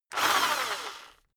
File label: rock drill rev medium short